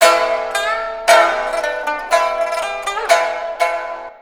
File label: PIPA LOOP; USB Soundscan vol.60; Asian Traditions; AKAI